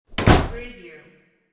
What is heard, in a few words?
door close